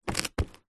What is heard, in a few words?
foley wood box case small set down on concrete; zapsplat